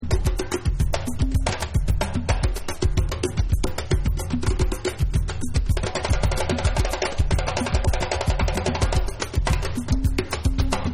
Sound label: Beats